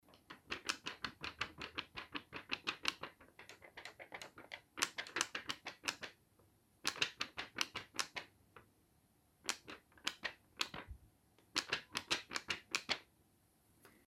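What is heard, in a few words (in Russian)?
трясём быстро доллар; Звук купюр, долларов; Звук купюр, долларов, отдельно и в пачках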